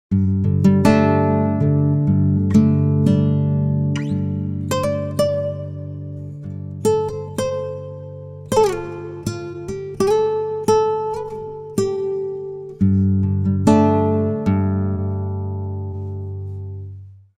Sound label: guitar, pitchshift; izotope radius